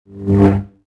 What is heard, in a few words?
saber hup; weapons